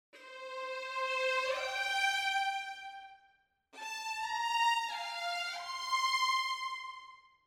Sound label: Agitato Violins Gentle Detache